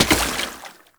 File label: Step Water, Foley; Warframe; Oraxia